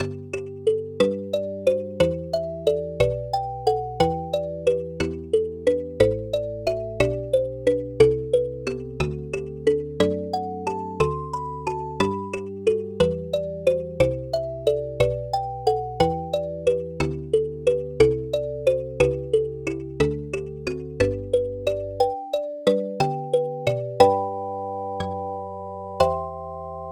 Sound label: kalimba bach